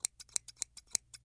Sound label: item scissors